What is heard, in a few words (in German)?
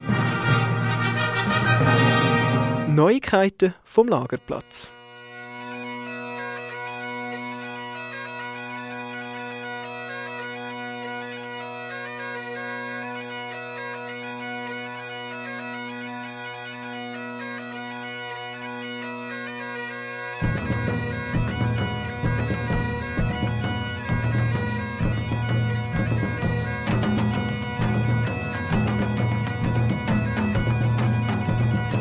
Jingle; Neuigkeiten vom Lagerplatz; pfadi radio